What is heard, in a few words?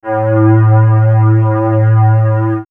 Swirls Soft, pads; X-Static Goldmine